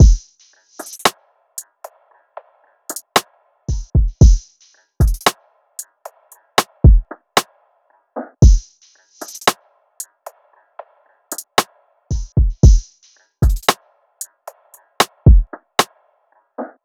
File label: Drum Loop 114 BPM; Prize; Cymatics; Vibes II Drum Loop Collection